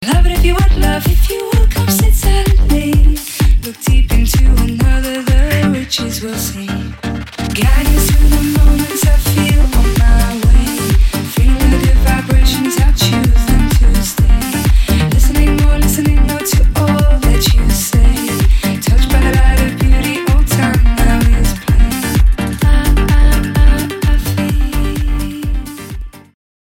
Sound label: vocal minimal